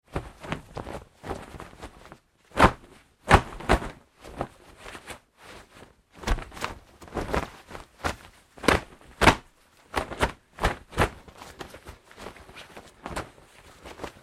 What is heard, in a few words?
Foley Fight Moves Pillow Case Sequence, wrestling and struggling; BigRoomSound